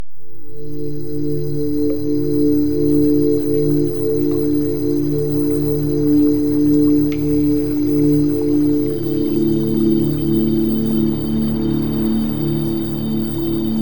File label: Music Theta Waves